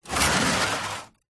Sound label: industrial large heavy 10l tank plastic full water slide hard ground; zapsplat